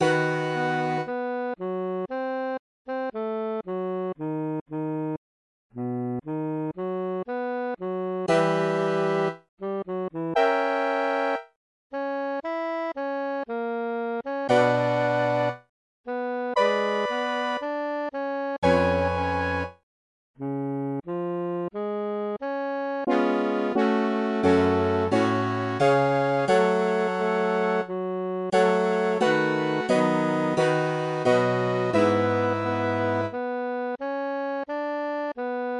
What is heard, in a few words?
MIDI